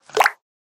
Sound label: splash